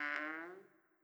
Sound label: Sarco Squeak